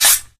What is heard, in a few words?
katana draw; tf; samurai